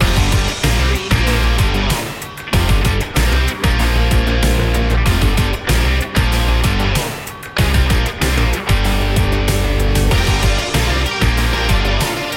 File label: epic trailer sports loop